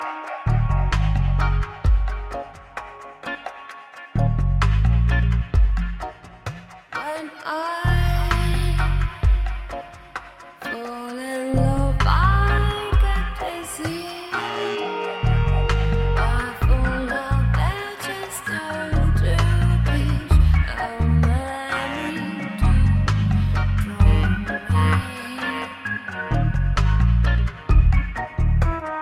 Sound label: jazz